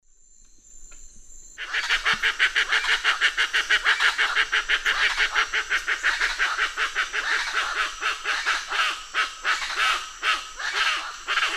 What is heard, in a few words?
Ortalis guttata, group alarm calls; S. America; Eastern Lowlands